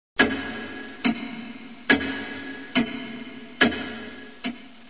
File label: clock